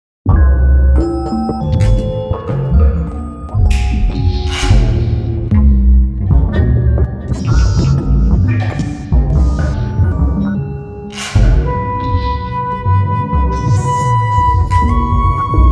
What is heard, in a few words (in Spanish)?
Electronica percusión y flauta; chamber